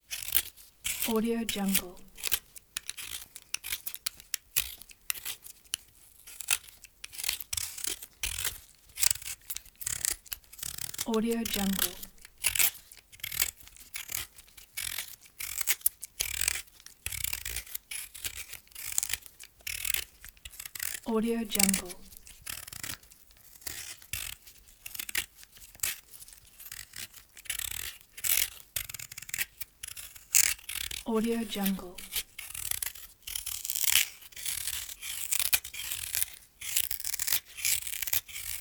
peeling potatoes; soundjay

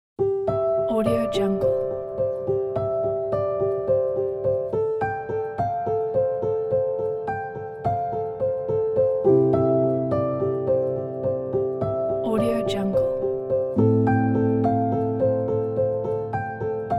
quiet emotional piano

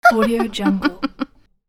boy giggle

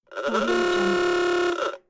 antique car horn

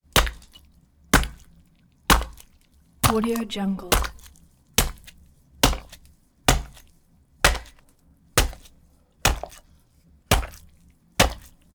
meat chop chop pork with axe big meaty impacts; prosoundfx

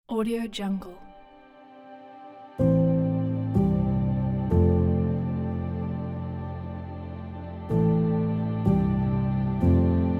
ambient cinematic

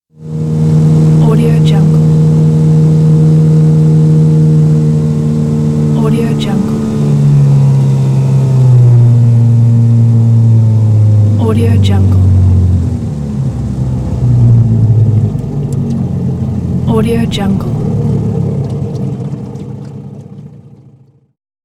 boat outboard; wistansound

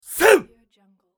karate voice

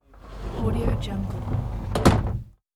closing compartment door passenger train car

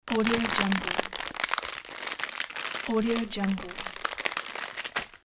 rustling package

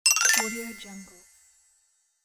magic toy xylophone gliss

